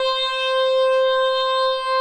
Lead C6; 刘醒哥

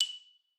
xylo

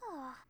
Huff; Momiji

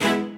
strings

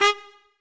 Trumpet melo

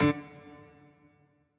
piano riff intro

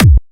Kick

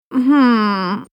Hmm...; Marisa

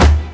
Kick; 赛马娘

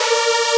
synth

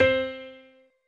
piano

